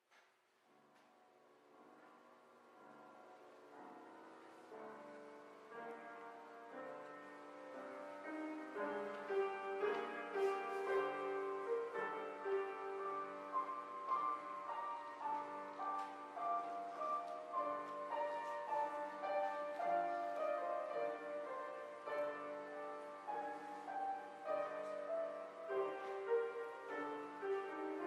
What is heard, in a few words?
singing, opera; [Canadian composer]; [20th Century]